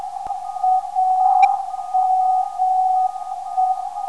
332kHz, ndb

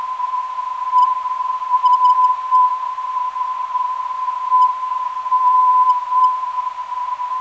384.02kHz, ndb

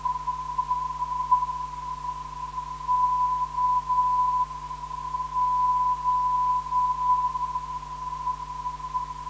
455kHz, NDB

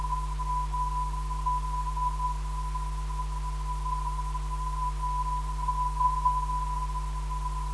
417kHz, ndb

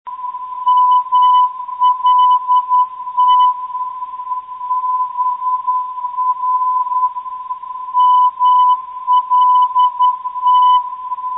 395khz, ndb

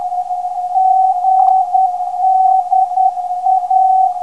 320kHz, NDB; GDA

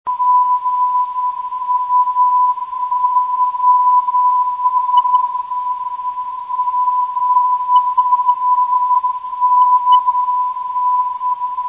guy 361khz, NDB

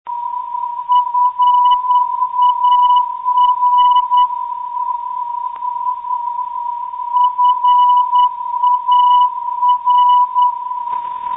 far 332khz, NDB

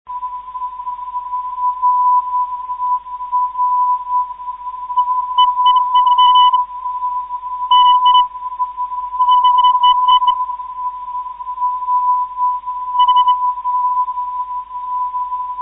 rer 480khz, NDB

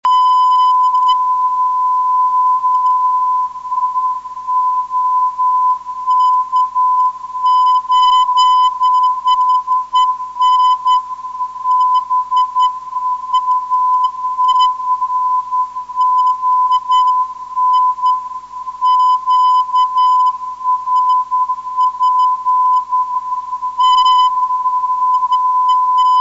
3600 kHz, hf beacon

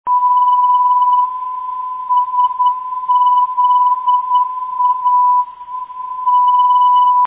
sza 349 5khz, ndb

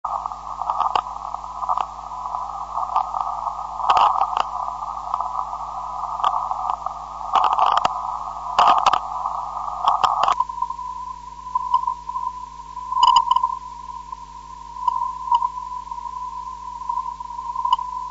503khz

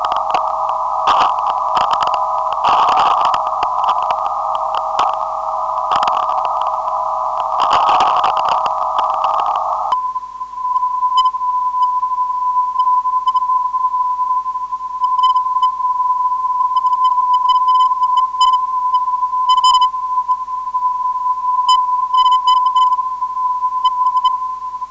476.3kHz, ndb; CHA

